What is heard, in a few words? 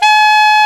sax alto mp G, Short